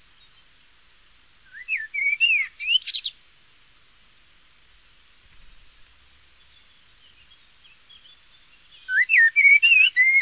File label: kos Turdus merula